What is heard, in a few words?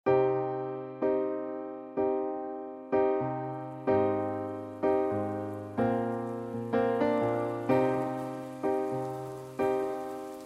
Music; Easter Movie Combo